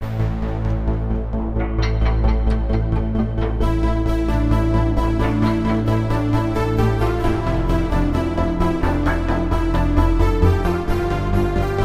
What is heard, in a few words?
Wavestation Gate; KORG OASYS; oasys assault